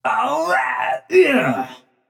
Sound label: nv yay; smite; xbalanque